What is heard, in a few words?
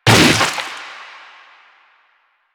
Arm Attack Hit; Enemies; Warframe; Proto Infested; Runner